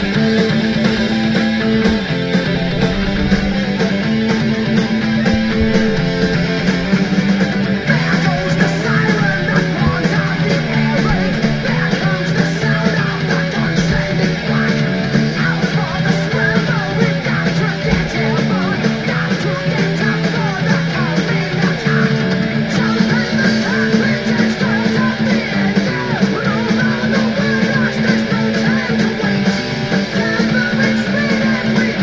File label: music; tfc